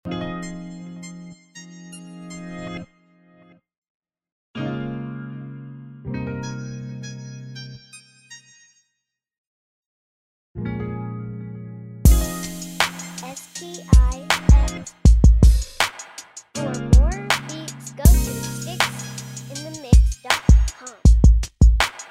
Vintage Ride

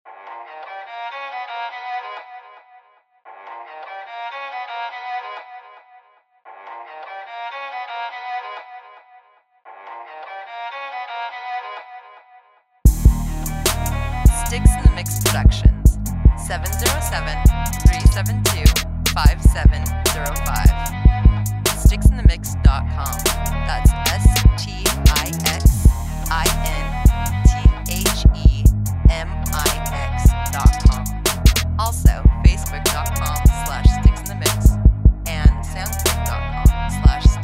Cello

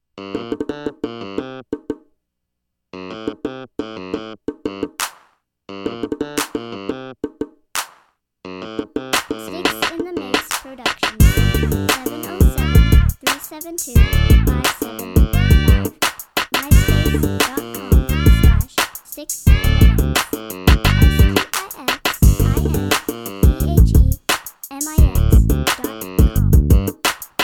horn syrup

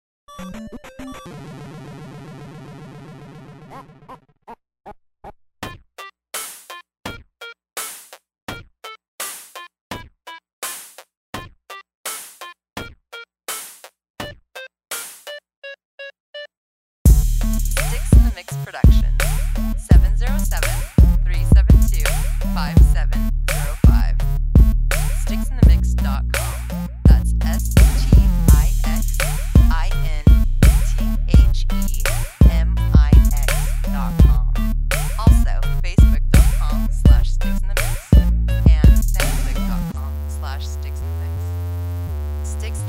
8 bit Game

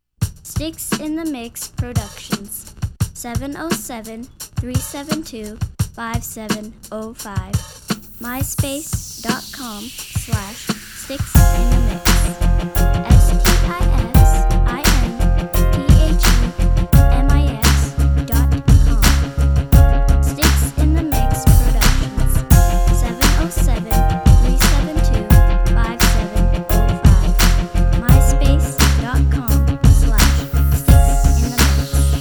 first beat

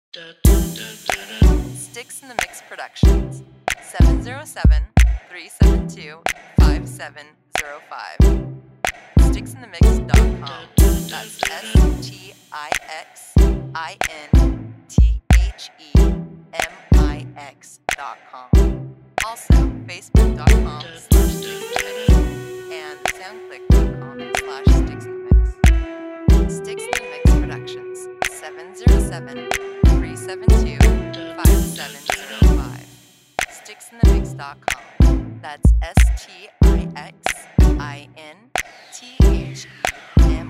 Lofi; Lofi Smoke

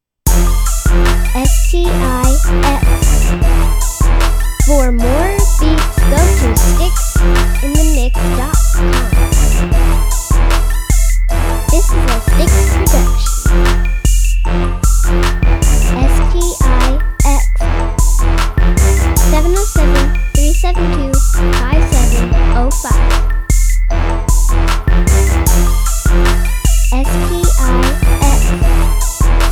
slow crunk

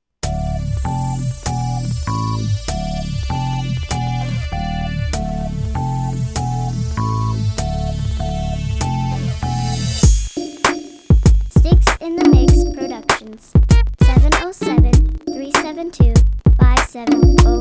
beat

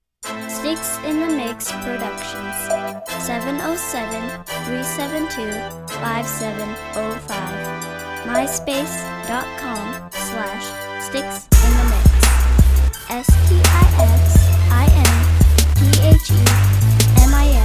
hard brass

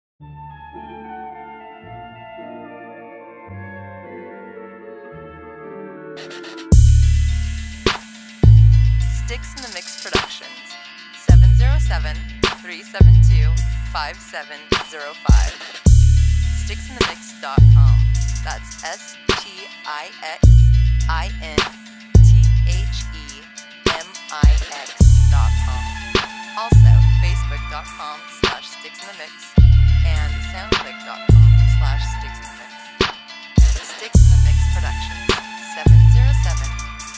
Saw; Saw V

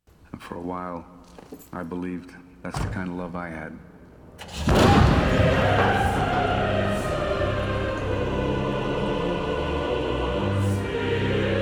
violins

